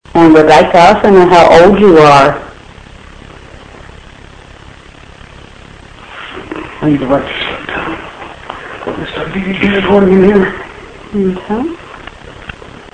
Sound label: shut down